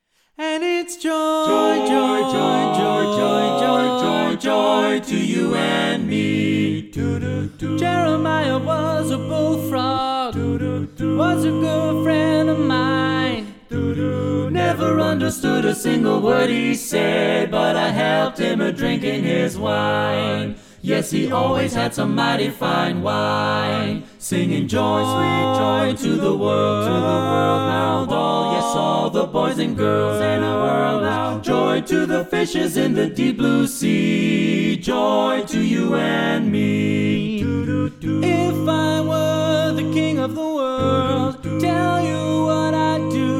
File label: Lead